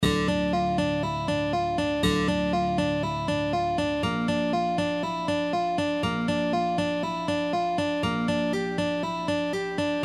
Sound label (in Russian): perebor